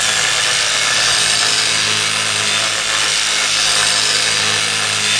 power ball; robo rammus

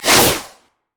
crit attack; khazix

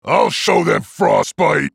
attack; Trundle

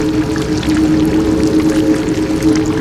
rabidbite loop; LoL; trundle

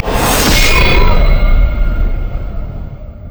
windwall; LoL; yasuo base

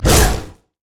pounce; nidalee base

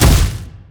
basicattack; maokai

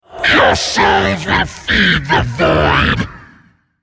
attack; Greenterror; Character Voices - English

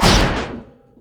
wolfman vs metal; LoL; warwick

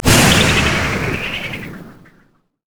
howlinggale launch; LoL; janna

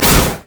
mage chains; LoL; ironforge xerath

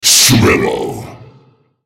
spell cast effort; nasusskin05; Character Voices - English

